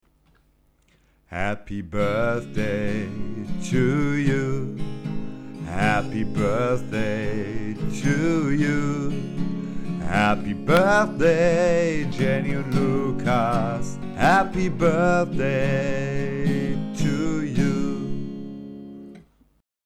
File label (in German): happy birthday gesang